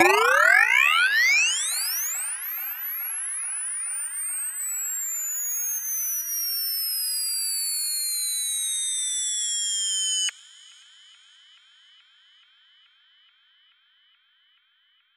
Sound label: Riser, Indie Pop; درام کیت پاپ; make pop music indie pop